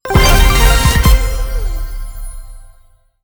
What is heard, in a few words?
large win; netent